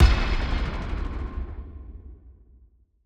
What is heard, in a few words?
Detonator Desert Eagle; Nova Sound